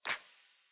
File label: clap